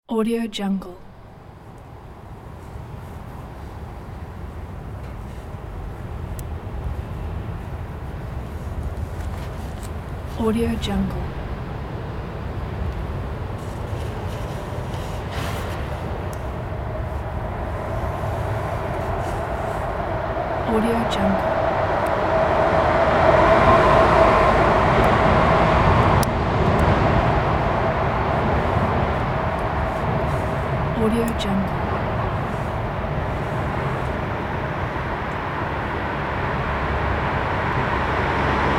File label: street ambience